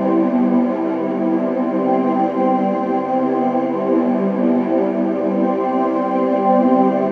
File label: Cloud Chord; ambient realms